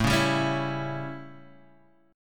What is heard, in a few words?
A 7th Guitar Standard, chord; strummed; JGuitar